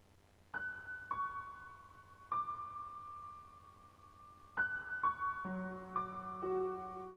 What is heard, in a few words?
short melody